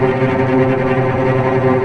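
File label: cellos tremolo C3 piano left, string; East West - The Ultimate String Collection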